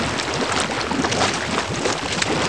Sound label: geyser loop; Ferazel's Wand Demo